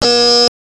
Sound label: no power, ambience